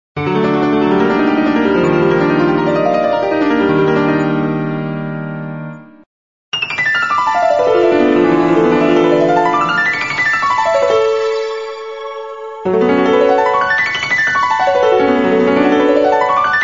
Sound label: smooth and turns